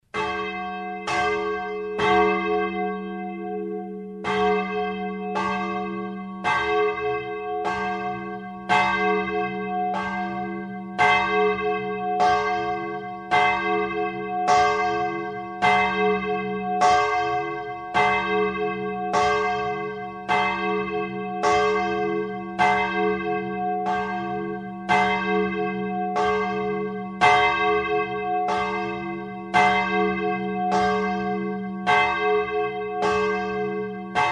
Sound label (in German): glocke klein